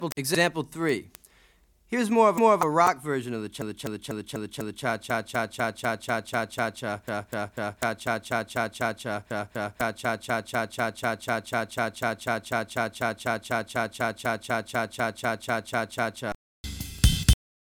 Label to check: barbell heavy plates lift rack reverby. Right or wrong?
Wrong